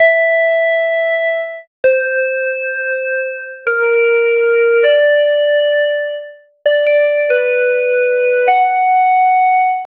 4 tone melody

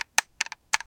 click sound for a button; wild west

